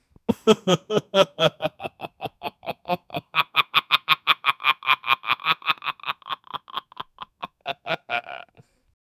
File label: an evil laugh ha ha